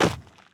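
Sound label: dirt to land; firefight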